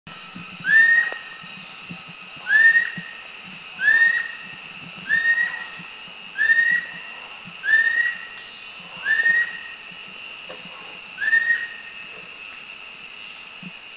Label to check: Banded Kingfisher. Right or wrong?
Right